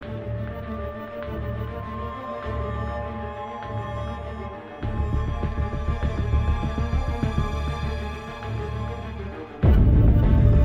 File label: Menu Loop; marvel contest of champions android ios gamerip 2014